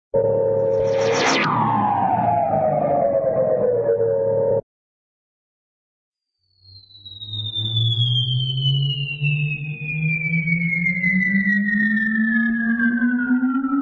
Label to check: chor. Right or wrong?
Wrong